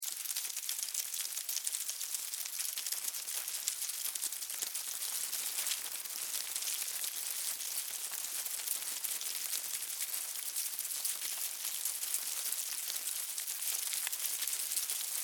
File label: Hive, Forage, Dig, Bugs, Movement, Insects; Miscellaneous Insect; Blastwave; Animals:Insects